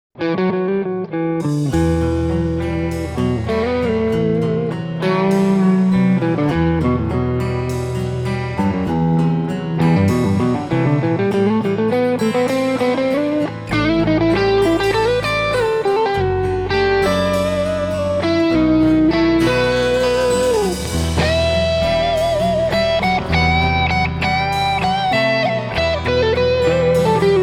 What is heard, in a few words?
Gibson R7 Duncan Antiquity Set